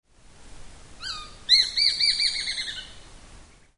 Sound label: ryukyutumi call; yanbaru